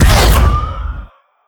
Ghost Wave

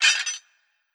Metal

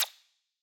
Menu SFX Cycle Options; Bop It! The Video Game

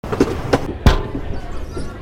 Window; The Henry Stickmin Collection

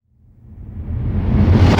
reverse; Soldier of Fortune Payback; PS3, Windows, Xbox 360

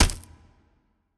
Impact Gore Crunch

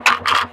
Ninja Hit

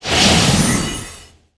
Ice Arrow Cast

